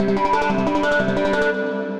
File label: Planetary Arps Colliding; Melodic Arps; Ableton; Mood Reel